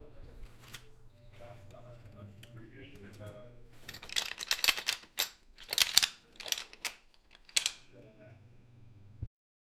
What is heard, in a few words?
weights bar lift, gym